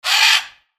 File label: Ara